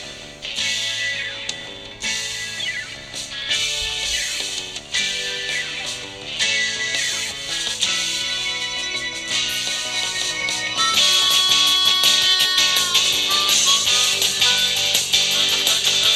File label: harmonica